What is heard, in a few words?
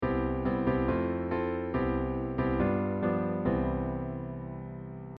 Closed Voicing